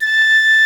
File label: A4 PICCOLO; Northstar; New Gold VOL-2